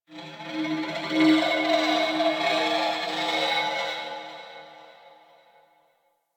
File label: Crp Ship Energy Moan Texture Med; Ambience; Warframe; Corpus Ship Remaster